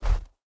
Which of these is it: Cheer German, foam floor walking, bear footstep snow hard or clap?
bear footstep snow hard